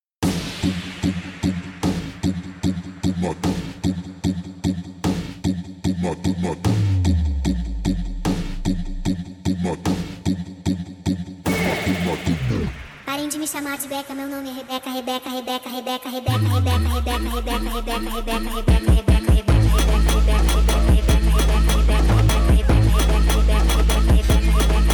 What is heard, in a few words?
sped up